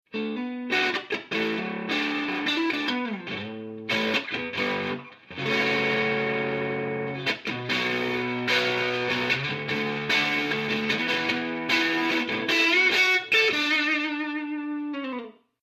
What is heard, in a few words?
overdriven